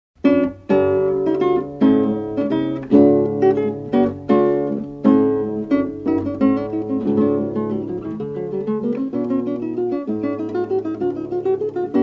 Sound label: Courante